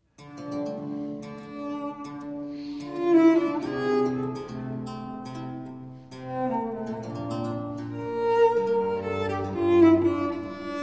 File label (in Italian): Sinfonia In Fa maggiore, Adagio, Violoncello e Basso Continuo; GB Pergolesi; Sinfonie and Sonate del settecento italiano per Violoncello e Basso Continuo